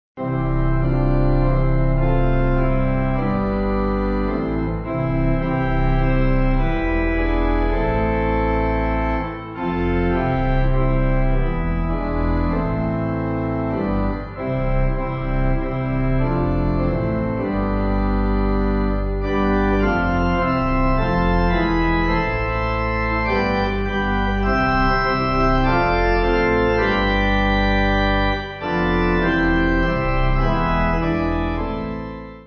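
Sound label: Pipe, Pipe Organ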